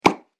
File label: Tennis Ball Hit; Sports Sound Effect; Tennis Sound Effects; Andrew Fleming